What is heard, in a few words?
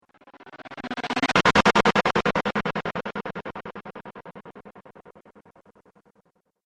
Arrested Stutter Transition